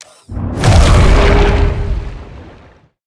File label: creature shadow leviathan sea truck attack exit